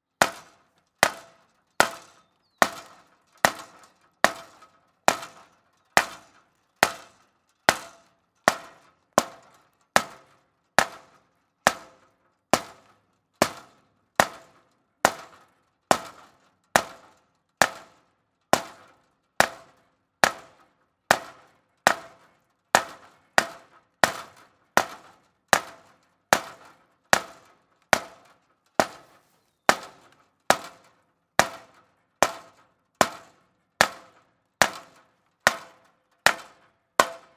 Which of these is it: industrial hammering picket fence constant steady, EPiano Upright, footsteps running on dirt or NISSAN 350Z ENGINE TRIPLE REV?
industrial hammering picket fence constant steady